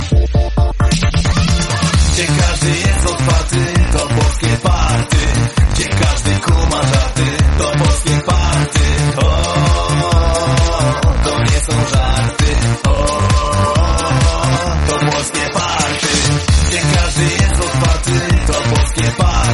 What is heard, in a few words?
polish party